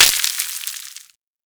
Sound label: Forest Pinecone; Props; Warframe; Grineer Forest